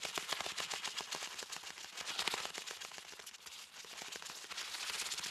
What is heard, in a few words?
Mantis Flyer Child Fly Loop